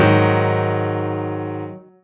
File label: Three G#2 D# F#3; osu